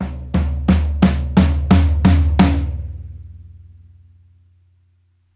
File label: 88bpm rock fill loop; Hayman